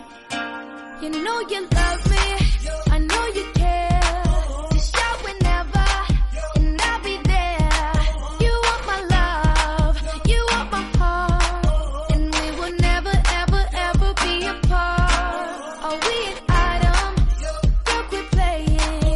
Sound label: baby song, english